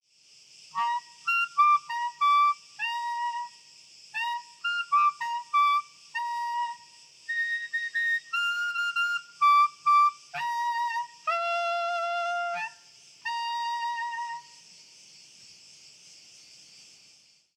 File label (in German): Oberton Spiel Rein